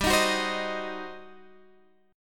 G Major 7th Suspended 4th Sharp 5th, Guitar, Standard; GM7sus4#5 strummed; 15,18,16,17,16,x